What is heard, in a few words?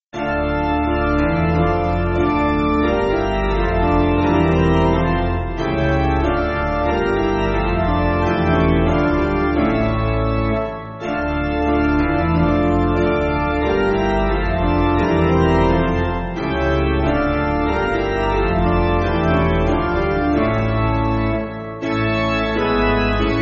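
Piano, Music Backing; Basic Piano & Organ (CM)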